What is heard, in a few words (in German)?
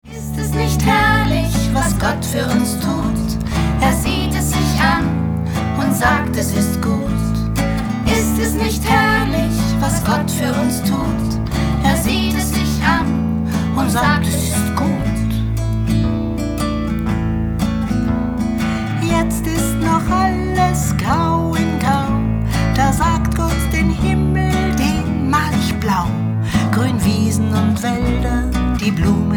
Schoepfungslied